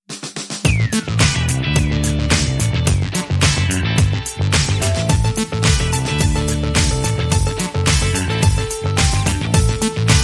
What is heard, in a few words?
Flutey Loops